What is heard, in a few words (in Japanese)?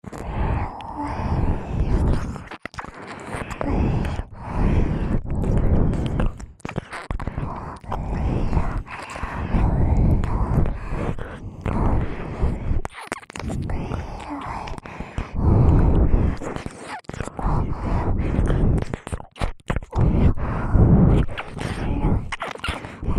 吐息交じりの両耳舐め 通常耳舐め